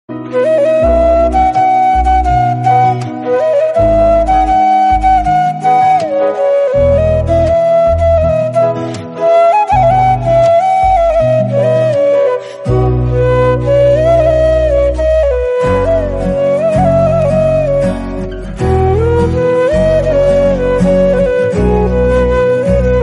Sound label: instrumental love bgm music